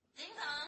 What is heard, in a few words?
ding dong woof